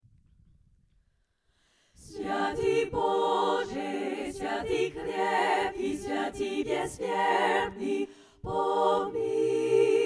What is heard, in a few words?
tutti; Svyiatyi Boje